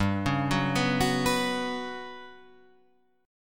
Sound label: G Major 7th Flat 5th